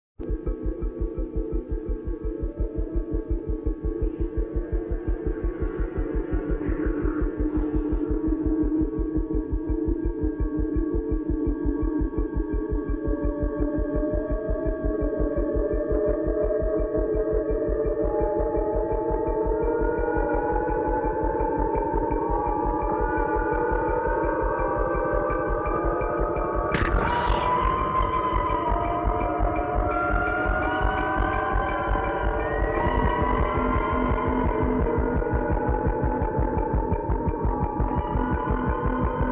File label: bgm; freak fortress 2; alien